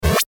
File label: stamp; anime